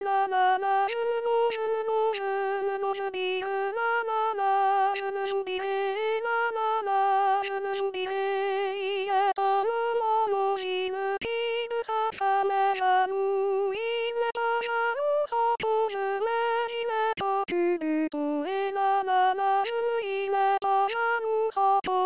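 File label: soprano; Pierre CERTON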